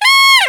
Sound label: SAW; East West; Global Sounds